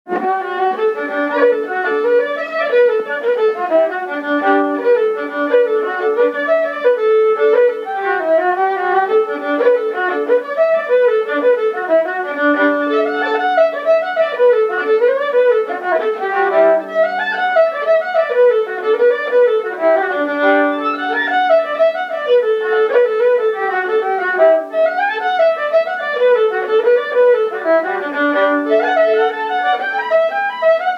jig; The wandring minstrel

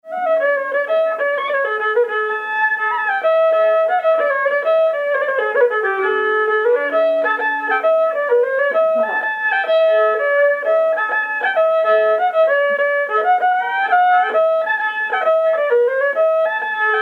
Slide; Kerry Slide No 1

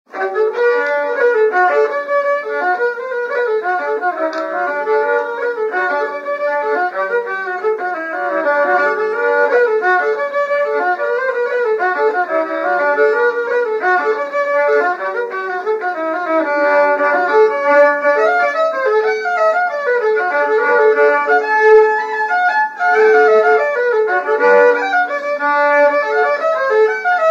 jig; The Cullen

